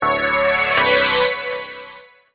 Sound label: Drum 'n bass short